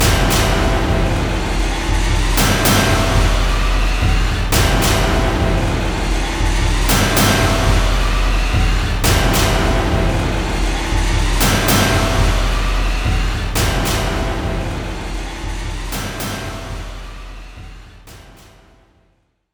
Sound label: Statue Explode; Disney Infinity; Calypso Bayou